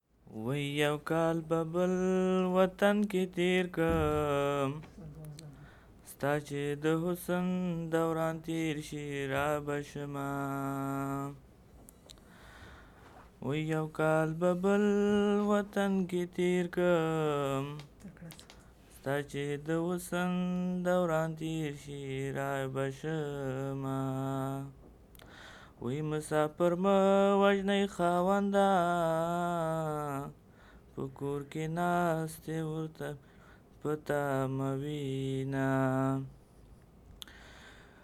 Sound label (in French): CHANT